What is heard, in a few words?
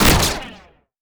Other Guns Rifle, Futuristic Guns; David Dumais Audio; Futuristic Guns Sound FX Pack 3